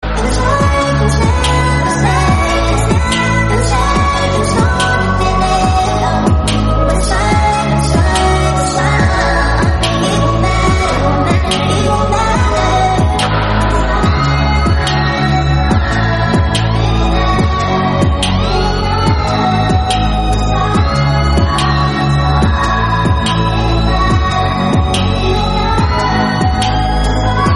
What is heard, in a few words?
instrumental